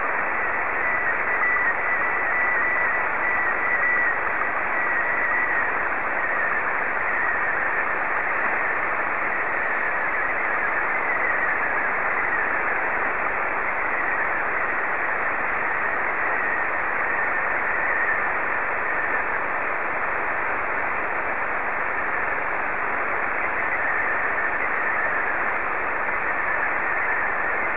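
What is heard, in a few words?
SSTV, Robot 36; UQ2LLD; VK4MSL SSTV RX @ QG62ln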